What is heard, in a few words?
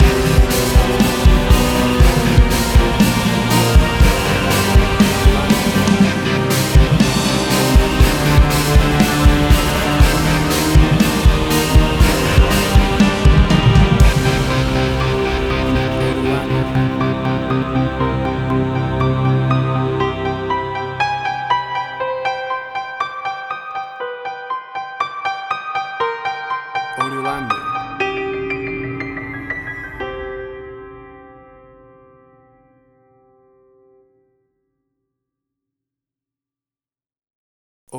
Action Trailer Sounds